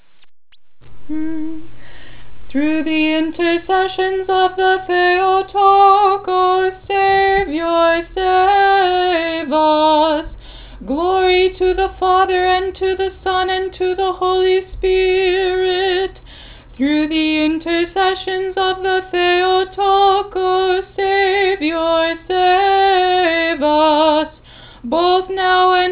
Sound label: First Antiphon